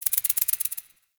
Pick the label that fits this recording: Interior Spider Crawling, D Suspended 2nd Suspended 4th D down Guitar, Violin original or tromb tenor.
Interior Spider Crawling